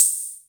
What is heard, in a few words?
Cymbal C78; gate experiments